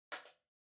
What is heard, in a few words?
TV Hardware Far RC Button